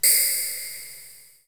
Snare, Korg Z1

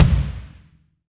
BD Real

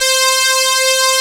PANNER SYN, SYNTHS; Pro-Rec - Synthsonic

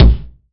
BD, BASS DRUMS; EdgeSounds

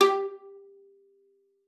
G4, VIOLA PIZZ; Xsample VOL-5; 90 s Sample CDs

